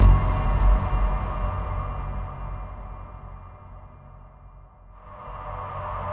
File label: Tension; Mirror's Edge (2008)